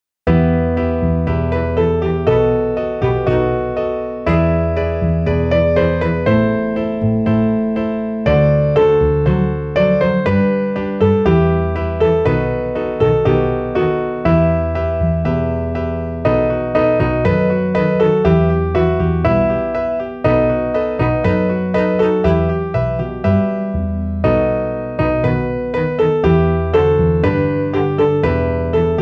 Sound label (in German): midi klavier; voller unrast; Klaviersätze